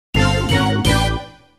final lap; Wave race

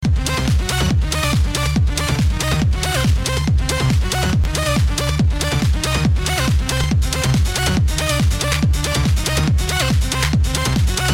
electronica

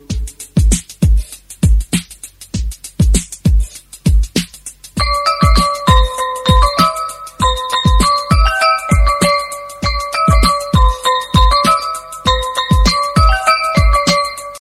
telugu beats

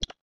Lock; Note 3

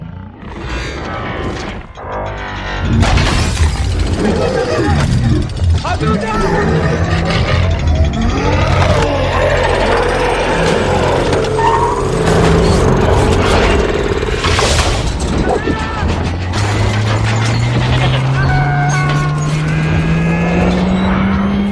Explosion

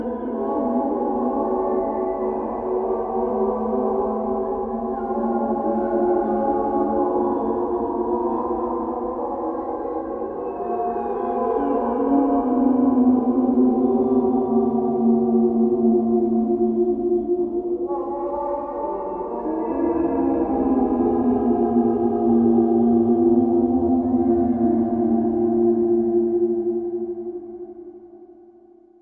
moaning spirits; ringtones

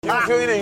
Laugh; Kim SooHyun

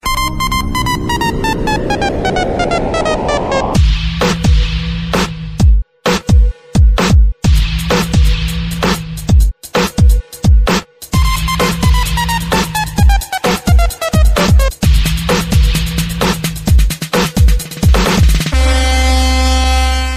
cool mix

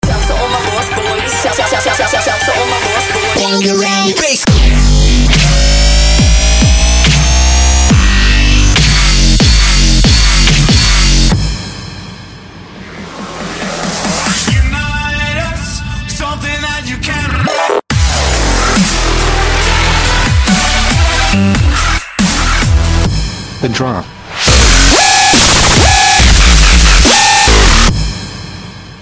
Brutal Dubstep

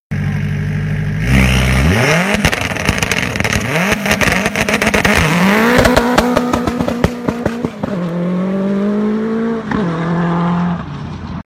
Suzuki Rally Car

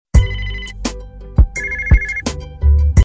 Alert

